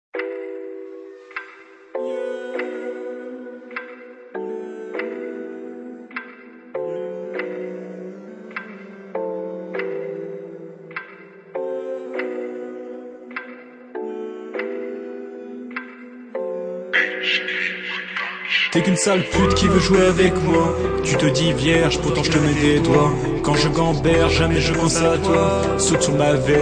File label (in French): Rap